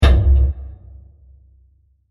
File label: Massive Hit; Blasts; Impacts; CraftedSignals